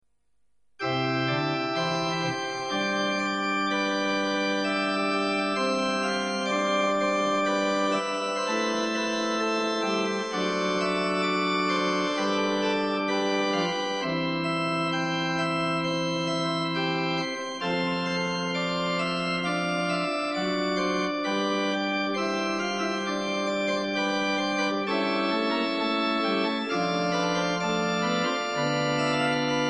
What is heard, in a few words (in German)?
Fantasia C Dur; BWV 570; Bach